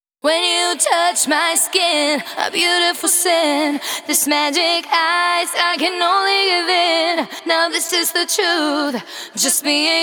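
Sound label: Female Vocals Verse WET, 140 BPM; Vengeance Samples; VTDS2 Song Kits; Female Take Me Away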